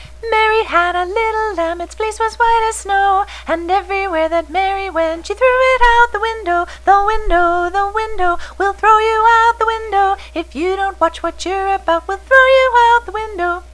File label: window; Guidingsongs